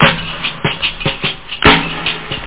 jungle-snaredrum+shellen

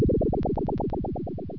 mischen

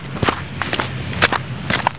SCHRITT; Kunsthaus Tacheles

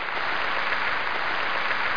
KLATSCH

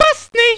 falsch

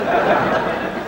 krach; DrMozek

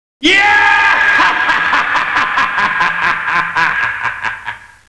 LACHEN

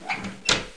VILLA TÜR AUF; TKKG 2

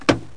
Tür; Loewe1

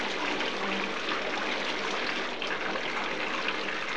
Dusche

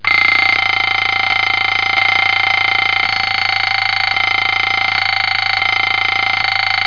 Wecker

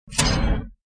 scharfer klick; MinorityReport

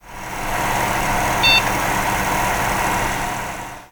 Computer hochfahren; Singles Flirt Up Your Life

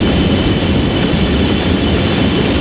GLEITER

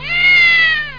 KATZE

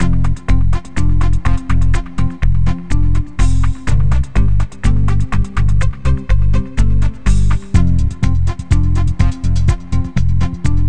MUSIK; MOBICLIC 89

